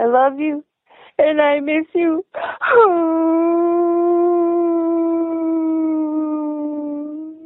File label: girlfriend crying uh uhuuu